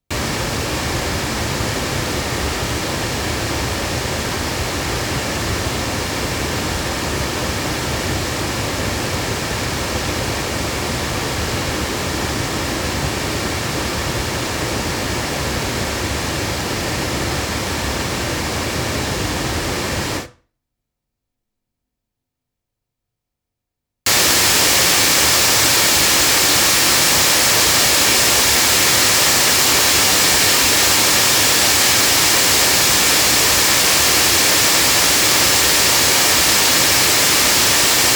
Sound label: front loudspeakers; CT NakedEye; crowley tripp